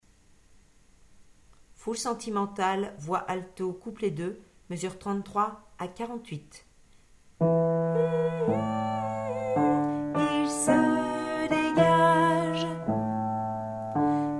alto couplet

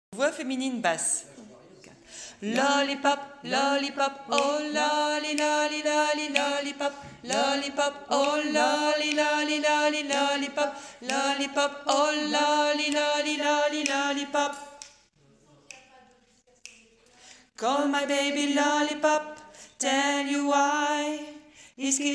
voix fille basse

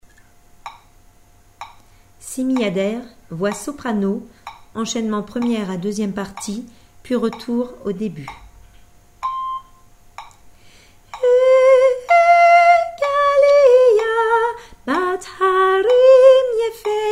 soprano

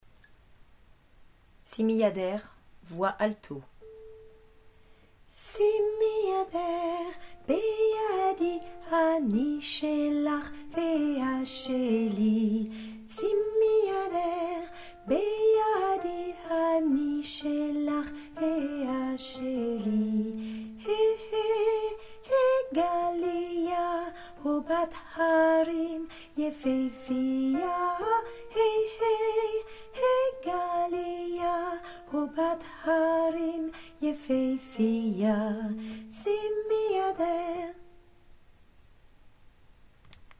alto

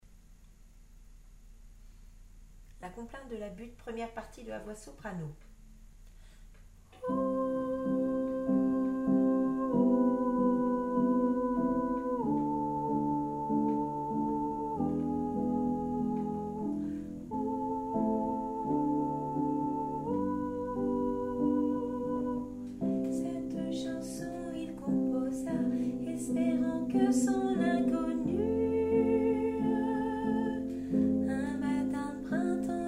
la complainte voix soprano

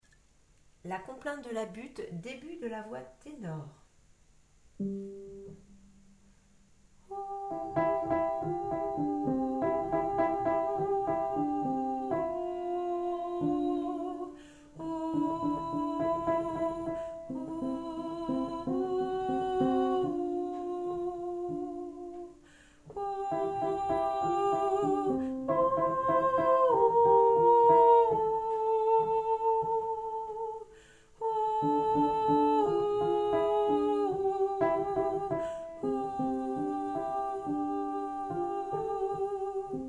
la complainte debut voix tenor

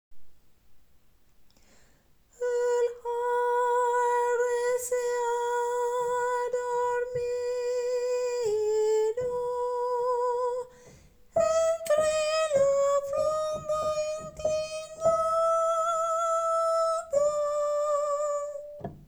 tenor aigu; crepuscular

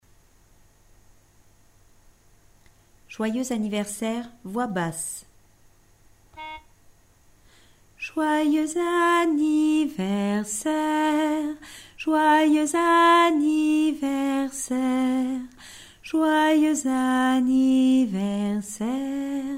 happy birthday basse

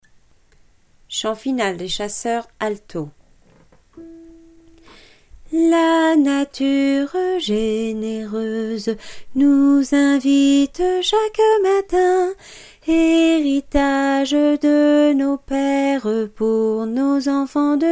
hymne des chasseurs alto

